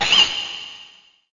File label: Wind dmg; EmuClient